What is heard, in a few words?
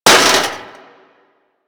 Metal Damage, Impacts; Warframe